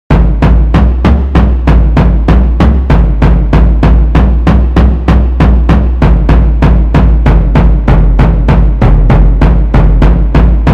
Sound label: Orchestra Drum Track